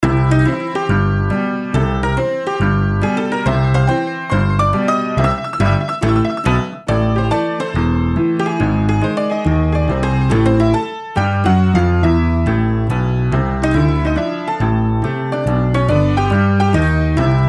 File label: Saloon